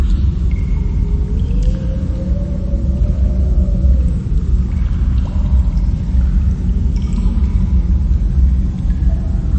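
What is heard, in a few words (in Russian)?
пещера звонкий близкий капли в пространстве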